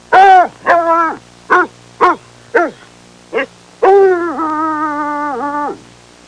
Bloodhound barking

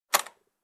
Door lock click sound

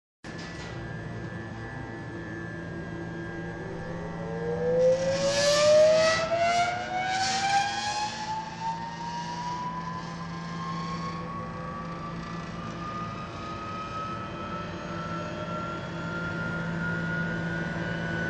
Planer Machine turn on and running

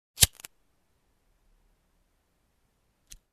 Lighter Switch

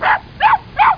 Puppy barking

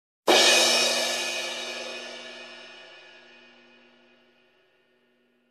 Hard big drum crash hit

